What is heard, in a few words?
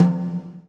GATE TOM, Drums Acoustic; USB Soundscan vol.10; AKAI; 90s sample CD; GATEKIT 2